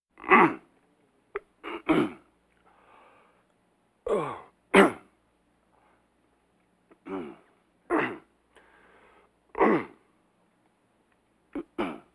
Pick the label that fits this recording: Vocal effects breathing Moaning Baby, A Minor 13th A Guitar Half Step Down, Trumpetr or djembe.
Vocal effects breathing Moaning Baby